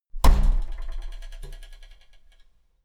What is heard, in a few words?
slamming door